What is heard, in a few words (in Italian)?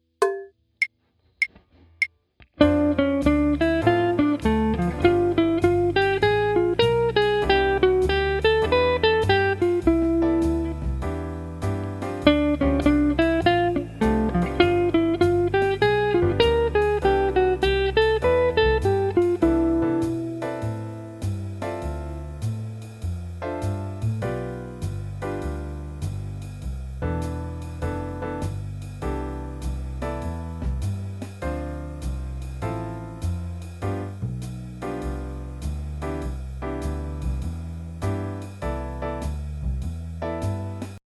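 Jazz Guitar modi, Scala maggiore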